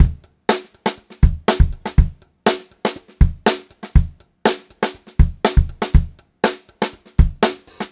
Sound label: 121 FK BEAT R, Funk-Groove Drumloops; USB Soundscan vol.38; AKAI; FUNKY